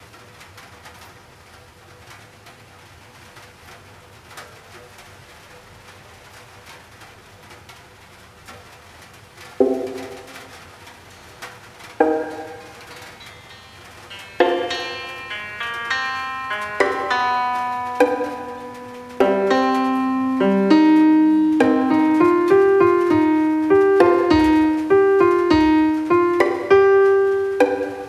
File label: rainy harbor; One Piece: Silver - Volume I